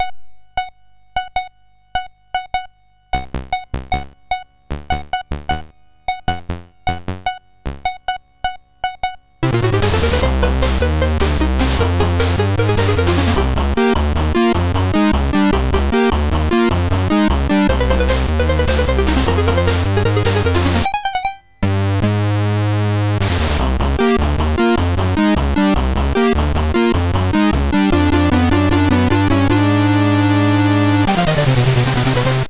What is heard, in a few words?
Security Alert, MOS6581R3